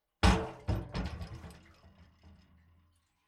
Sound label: Industrial Petrol Jerry Can Dropped On Floor Impact Liquid Sloshing Wabble